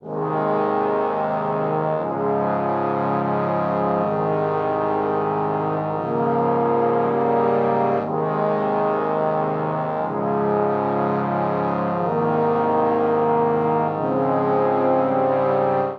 Progression I-IV-I-IV-V Ab Major 60 bpm, Brass Quartet; MIDI Clips; The Forge; Ableton; Spitfire Audio